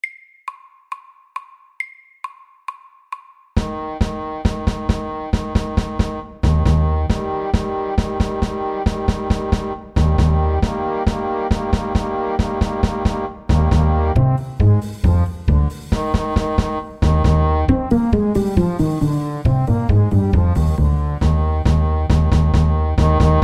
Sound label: Football match FAST 136